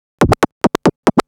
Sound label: Scratch Fills 140BPM